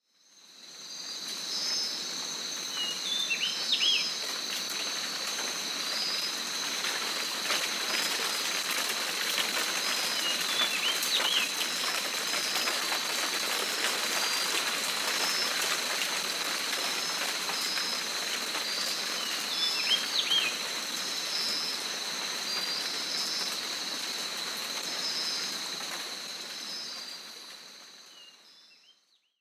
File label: Tropical Island Rain